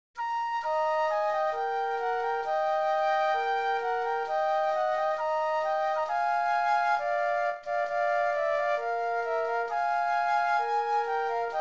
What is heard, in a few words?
flute duet; patriotic